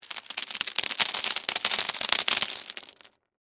Crash Stones Debris, Crash, Stones, Debris, Spill; Rock; Materials

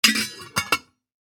Household Cookie Jar Close Lid Metal; Kitchen (Sound Effects:Household)

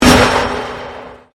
Convolution Pulse

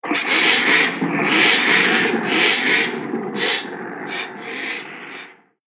Horror, scary soundscape; The Schlocky Horror Show

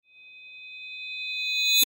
Crescendo Metallic High; Musical Sound Design; Blastwave

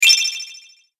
Ice Queen Spell, Magic

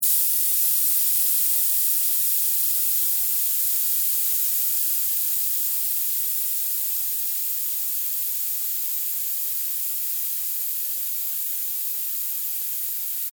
Pneumatic Release, Scifi; Air, Valve, Long, Science, Fiction; Spacecraft; BlastWave

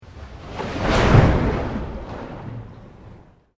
Sweep Organic Slow

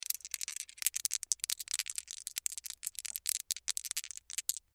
Casino Dice Shake, Casino Dice Shake In Hand Long; Dice (Sound Effects:Casinos); SPORTS; SoundEffects-Blastwave

